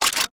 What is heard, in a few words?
Reloading begin; 完善武器音效; DungeonShooting; Godot